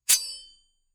sword attack fast; combat

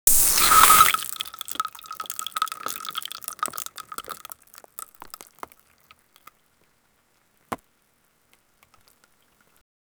frozen fruit that bursts, fruit

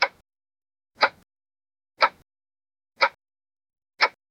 clock tick tock sounds

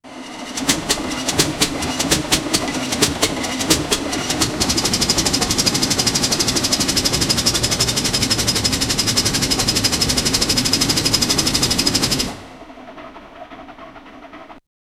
sound for a, steam engine

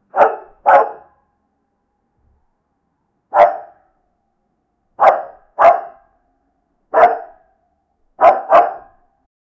barking sound, dog

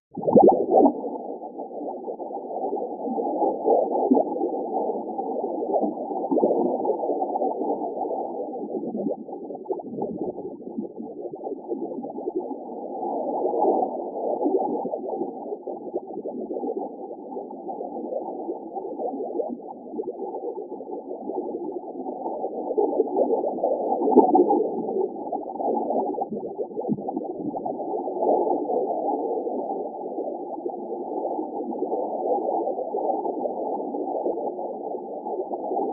retro futuristic sci fi, bubble

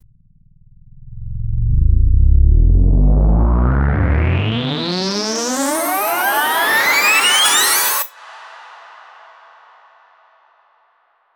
cinematic riser for a promotional

